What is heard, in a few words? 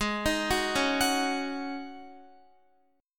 Ab 7th Suspended 4th Ab down Guitar